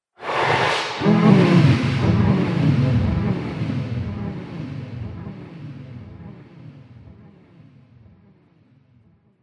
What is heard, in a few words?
Alien Sex Sound, alien sound effects